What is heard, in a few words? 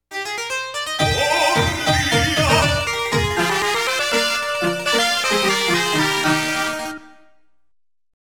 Victory BGM; Player 4; Konami Music Masterpiece Collection